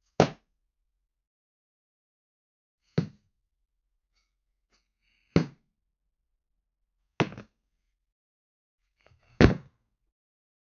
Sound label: human falling on another, human interaction